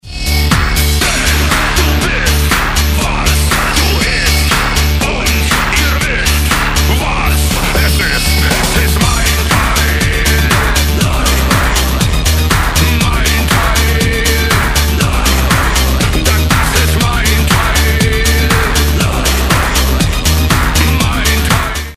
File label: no guitar